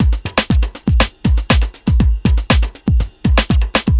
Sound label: 120 beat full, Nu Jazz House; PowerFX